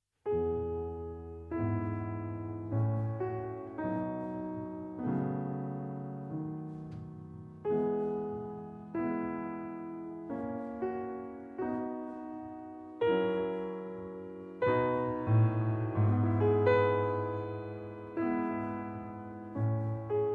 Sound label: Piano Sonata No. 30 in E Major Andante molto cantabile ed espressivo